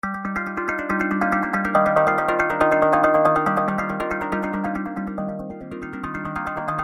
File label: Moving Guitar